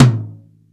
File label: R-8 rude tom, Dry